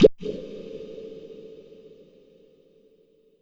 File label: Korg M1 Drop